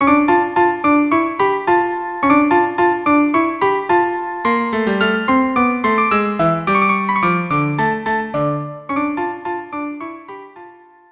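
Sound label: violin cello; Choeur des Gamins; carmen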